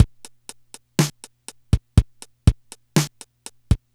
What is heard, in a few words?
Yamaha PSS-280 Rock Ballad